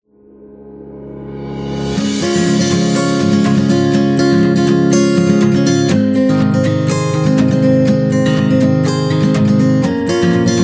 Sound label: Piano and Guitar